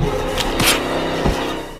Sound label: kill; among us 2